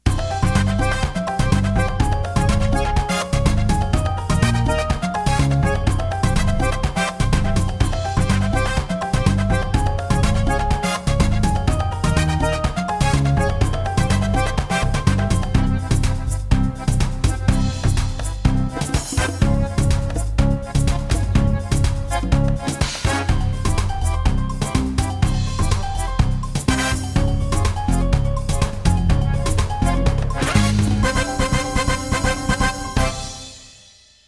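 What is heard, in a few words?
batucada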